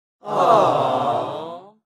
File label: Awh disappointed crowd sound effect